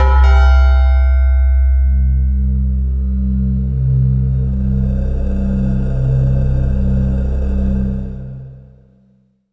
Yamaha SY22 Bell Wah C1

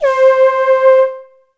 Alesis S4 Plus Shakuhachi C5

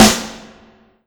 Yamaha TG100 Snare Drum

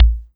Yamaha DX7 Bass Drum

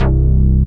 Yamaha TG55 Square Bass C1

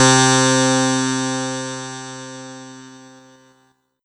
Korg DW 8000 Clav C3

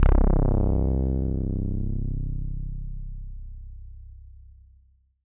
Ensoniq ESQ-1 Moog Bass C1

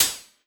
Yamaha FS1r Drum Kit 1 Open Hi Hat

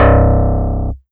Yamaha TX81Z Elec Bass C1

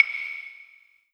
Woodblock

